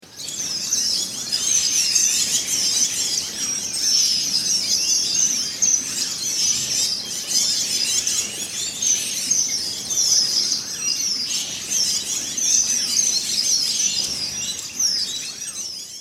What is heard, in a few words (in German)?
Voegel